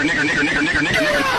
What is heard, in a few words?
police sound; getout for mogged edits